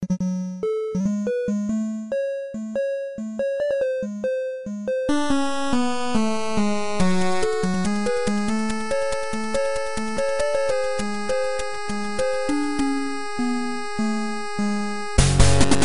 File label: Radio Ringtone 8bit